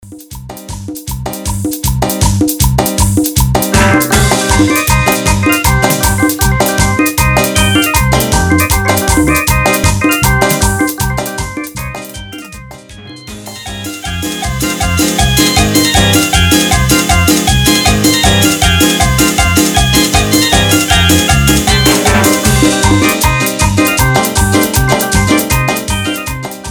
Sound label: Jahuay Jahuay Instrumental, Bpm 157